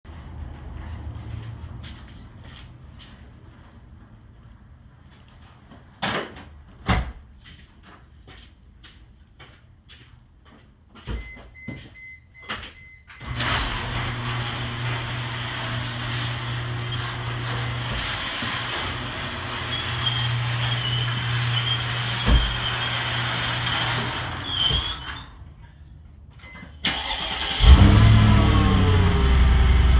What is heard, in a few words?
spongy noise when braking; c36 amg c43 amg w202